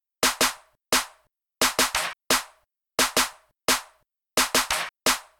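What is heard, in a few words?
Music Maker Snare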